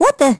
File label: teleport; Crypt of the NecroDancer